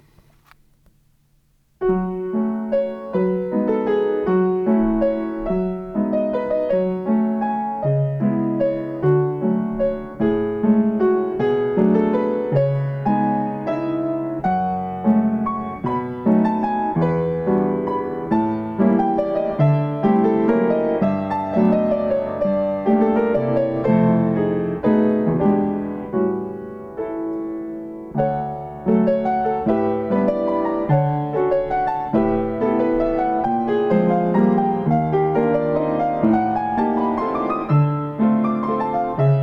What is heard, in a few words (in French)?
Valse d'ete